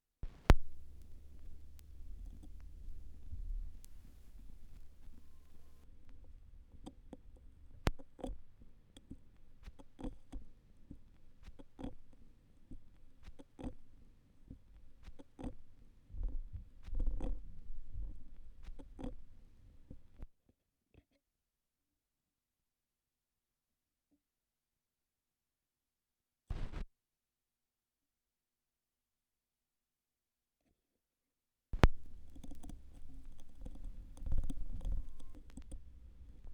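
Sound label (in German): schallplattengeraeusche, record noise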